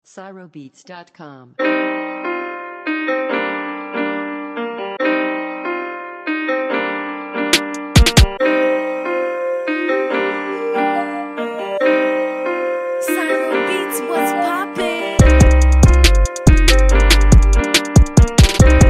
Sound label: Trap RapTrap Instrumental